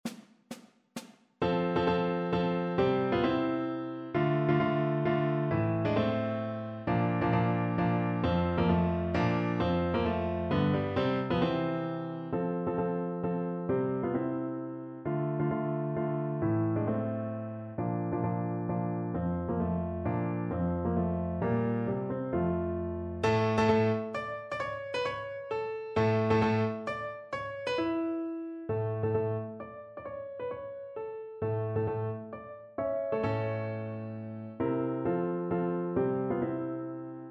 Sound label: Chopin Mazurek F dur op. 68 nr 3 accompaniment for clarinet